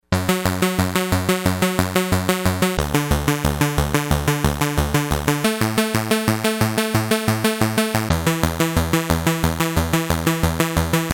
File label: pro1, synths